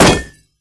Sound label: Rifle Metal, Damage